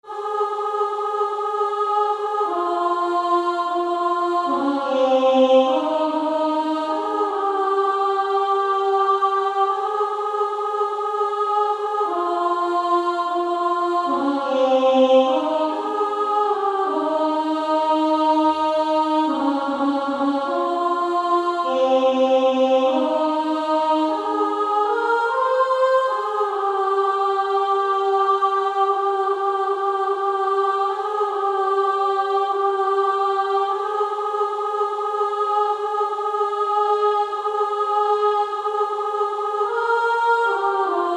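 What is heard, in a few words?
Female Choir, alto 2; Only a step to Jesus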